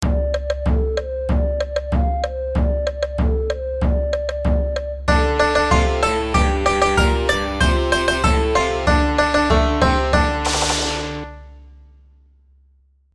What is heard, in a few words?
iphone ringtone